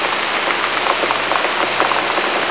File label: rain canopy, SPAD VII; Bombable; flightgear